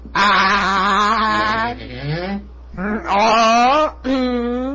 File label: aaaaaaaaaaaaaaaaaaaaaaaaaaaaaaaaaaaahn